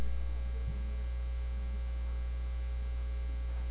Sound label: pipe